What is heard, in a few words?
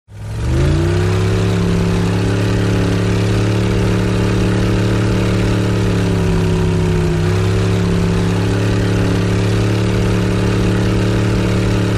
Scarab Boat On Board